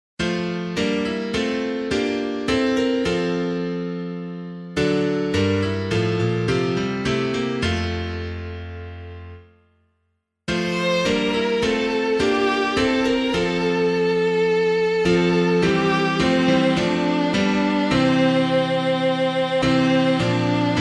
To Christ the Prince of Peace, SAINT ETHELWALD, piano, hymn